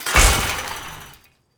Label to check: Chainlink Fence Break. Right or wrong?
Right